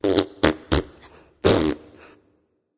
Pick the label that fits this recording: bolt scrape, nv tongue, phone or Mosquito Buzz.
nv tongue